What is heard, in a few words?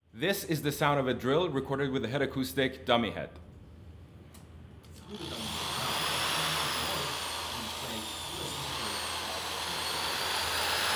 binaural drill; headacoustics hms